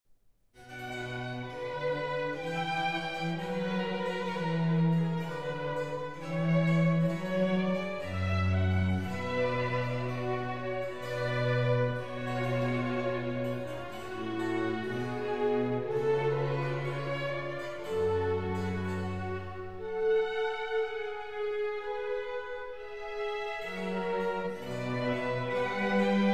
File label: Trumpet Concerto in D Major, Grave; Telemann